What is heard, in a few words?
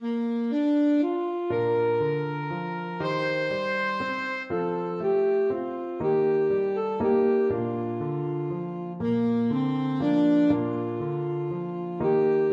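alto saxophone; morning has broken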